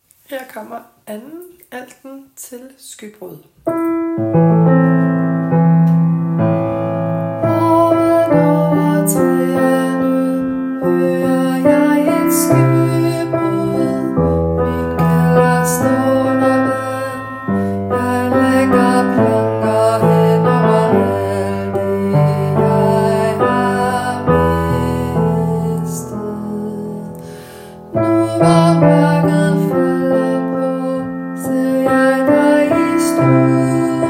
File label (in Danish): 2. alt